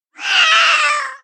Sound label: Cat meowing in pain scarred sound sample.; sound effects